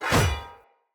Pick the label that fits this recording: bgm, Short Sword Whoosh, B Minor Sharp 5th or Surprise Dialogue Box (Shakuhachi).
Short Sword Whoosh